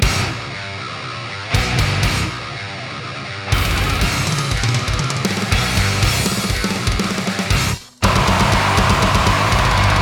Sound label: Tube Screamer Engl Fireball (full band stereo)